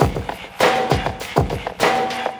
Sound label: drloop, drumloops, Vinyl Attack; Masterbits